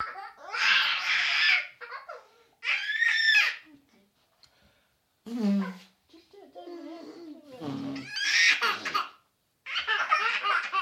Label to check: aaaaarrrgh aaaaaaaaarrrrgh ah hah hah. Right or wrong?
Right